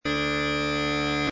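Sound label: practice drone, Uilleann Pipes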